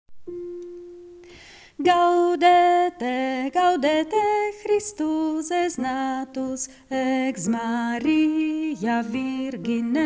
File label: bass; Gaudete